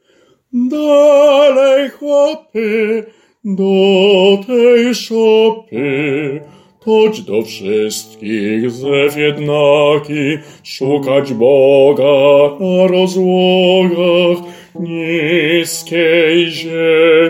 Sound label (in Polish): Bas; Za gwiazda